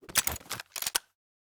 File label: Pistol Reload